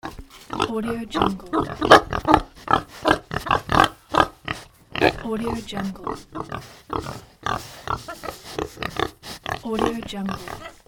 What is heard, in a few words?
pigs oinking